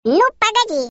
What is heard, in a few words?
grr